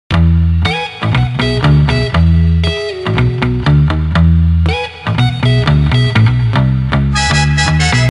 Fun buildup; Bully SE

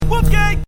Drink Soup

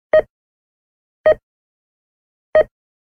Heart Monitor

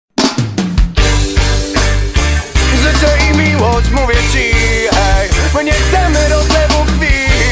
Ragga Sms

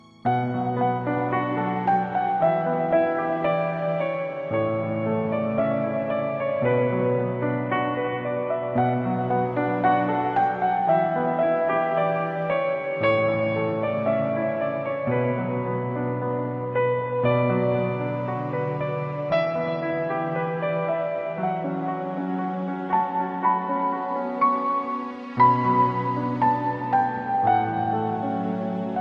Piano Instrument

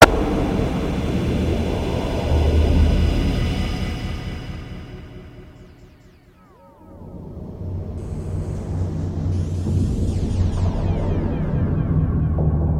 Alien Signals

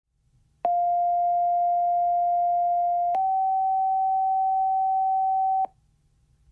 Fire Tone

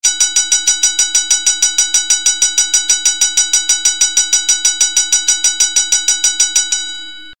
Wrestling Bell

